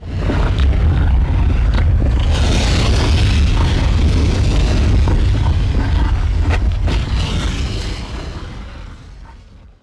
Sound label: lava pit; DAoC